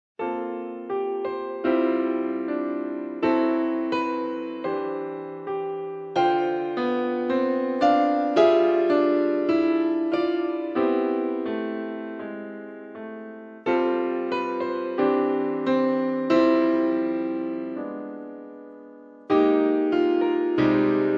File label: holiday